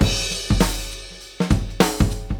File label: Dance Mega Hip Hop Electro; EastWest